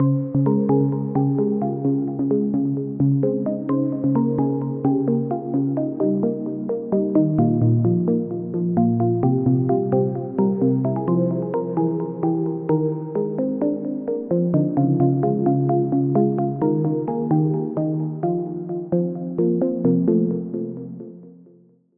Key, Sylenth1; Key - Ethereal Realm; Routed Sound; Sylenth1 Expansion - Story Walker Vol.1

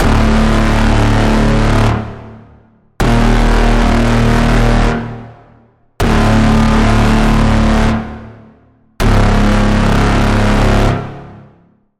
Braam - Burst Killer; Routed Sound; Vital Expansion - Quantum Enforcer Vol.1

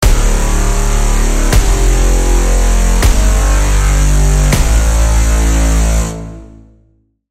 Braam

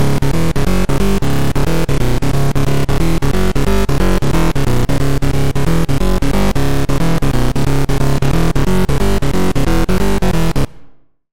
Bass; Routed Sound; Sylenth1 Expansion - Hyper Gamer Vol.1

